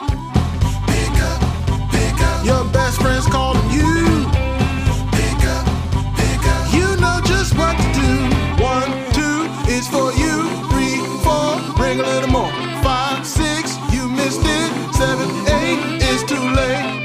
blues; Best Friend Calling

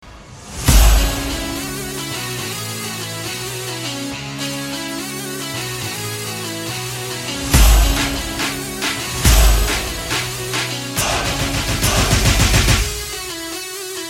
super guitar; Indian

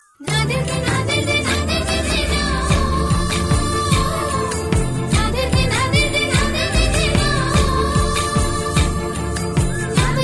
indian classical

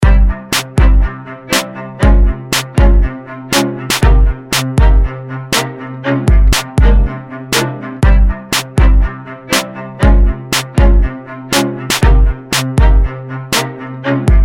dance strings; Electronica

